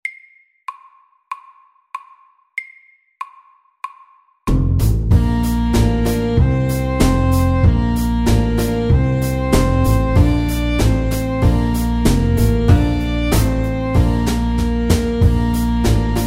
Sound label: Square dance SLOW 95